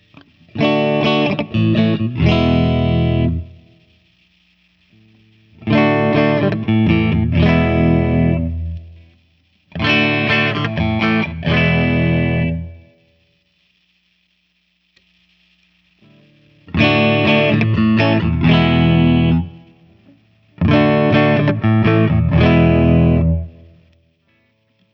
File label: Guild X79 US Clean Chords, guitar; Skyhawk; '80s Guild shredder guitar